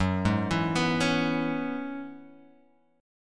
F sharp 7th Suspended 2nd Suspended 4th F sharp down Guitar Open F; F#7sus2sus4 arpeggiated